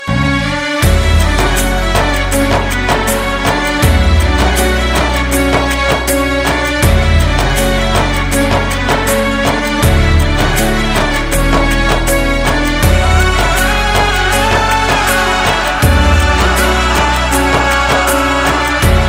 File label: romantic music ringtone, bollywood